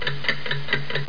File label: TIC TOK